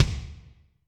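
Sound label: Kick Double, Alesis D4; Kik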